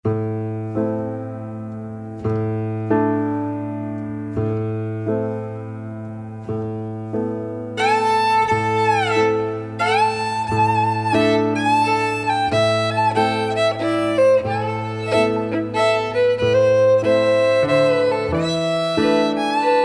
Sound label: Waltz